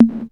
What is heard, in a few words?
DUB, DRUMS; SoundFactory; SMPMANIA